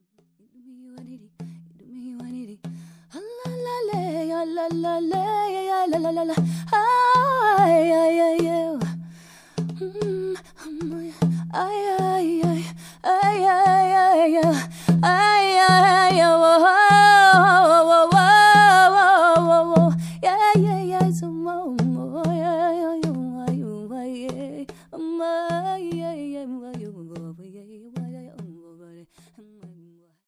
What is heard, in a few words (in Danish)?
trommesang; drumchant